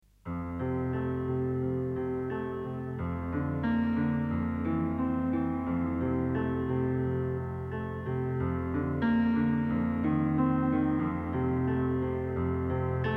PIANO

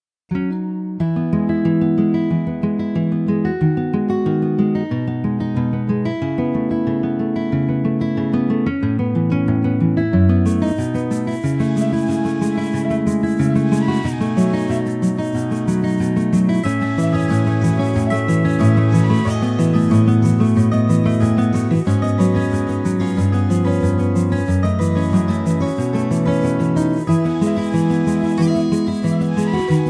ETHNIC NAMIBIE BALLAD

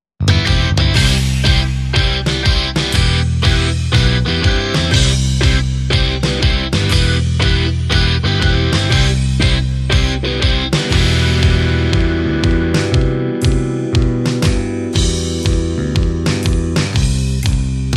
STEP ON WOLF

